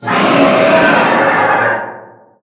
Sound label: qurupeco roar distant